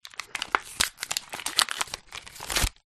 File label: batteries aaa remove from packet; zapsplat